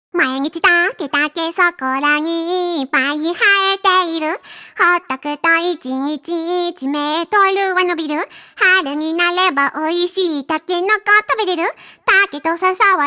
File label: Vo Main